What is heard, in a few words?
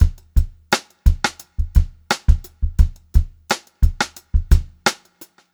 Funk-Groove Drumloops, 87 STRVAR; USB Soundscan vol.38; AKAI